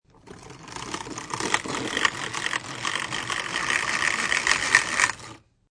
Pencil Sharpener

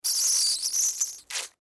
Bottle Squeeze Liquid Gel Squirt Slow; foley; Squishes; Objects; Blastwave

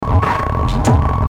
Mechanical Robotic Industrial Loop; Metallic

